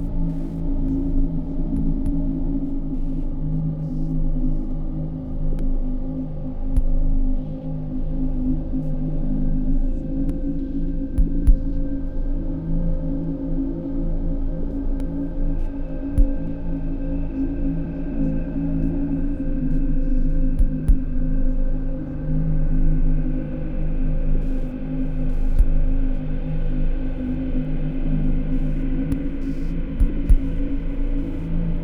Drone 01 Var2b (Looped); HellSign

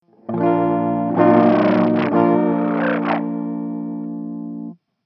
Battle S E Dark Flame; Hylics

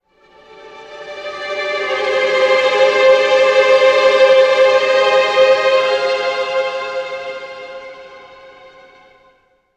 sounds music; Halo Combat Evolved; Xbox

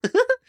Jump; Collector

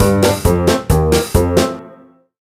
Game Start; tamagotchi connection corner shop 3 ds

